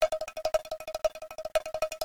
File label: reel spin loop; santa vs rudolf